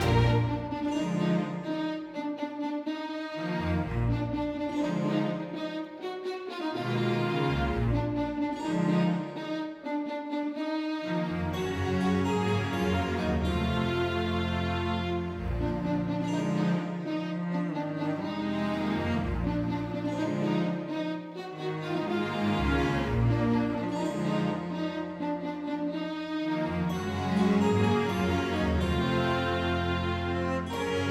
Music Ingame Windy Village House

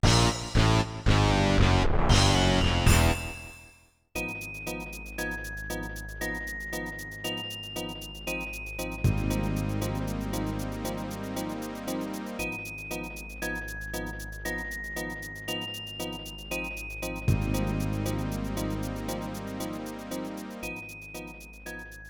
Fail To Advance Goal

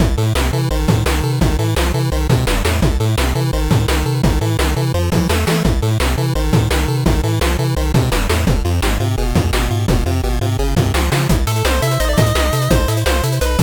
nighttime mono; Sigi A Fart for Melusina

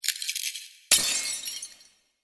Crystal break; Lethe

